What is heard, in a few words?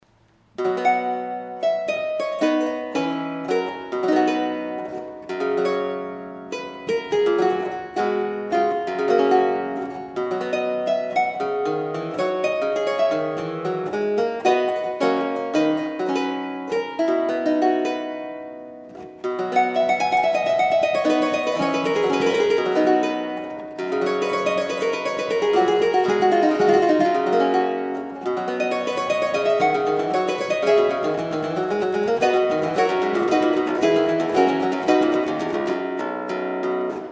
harpsichord; peerson primerose